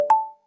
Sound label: incoming